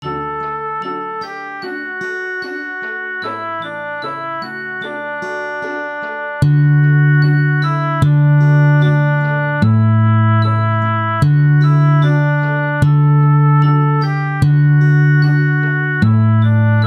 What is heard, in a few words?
Lullaby guitar